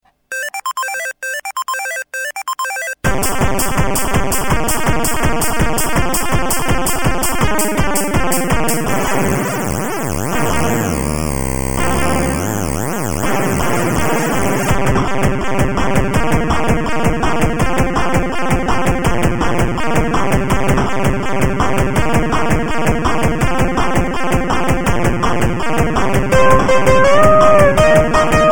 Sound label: funky chip